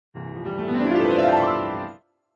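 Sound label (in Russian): фортепиано играет переход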